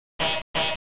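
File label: Door Close, Train; F40PH-2 x3 13 Car Superliner Long Distance Pull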